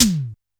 B2 - E Tom, Yamaha PSR-E323 Electronic Kit

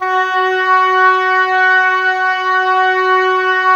OBOES F#, Woodwind Brass Ensemble; Miroslav Vitous

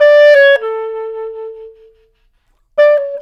Clarinet Solo; Jazz; Traditional; Clarinet Loops; Sonic Foundry (Sony Creative Software); Jazz Solos and Sections

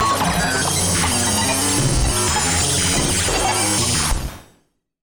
Malfunction Synth, malfunctioning synth samples

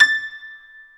A5 PNO; Keith Emerson Signature Series

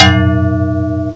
SPOKE; CMI Sound Library Rev 1.3

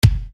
Bass Dr, EuroDance Bdrums

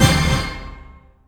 O-HIT, HITS

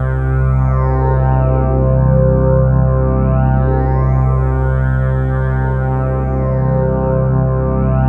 Roland JV 2080 LFO C1; MISSION LFO

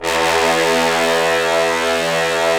BRS BNSF23R, Roland LA Composer; Roland - LA Composer vol. 4; Tbns

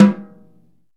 SNARE

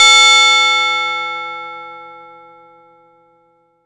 ROLAND JX3P A4; Big Fish Audio - First Call Vintage Keyboards

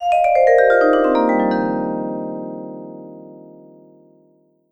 VBLCK, VIBE LICKS; Sampleheads - Dave Samuels Marimba & Vibes

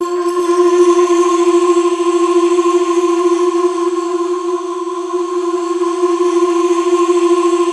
PADS

